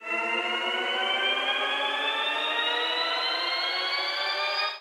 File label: respin Snd; netent; longpao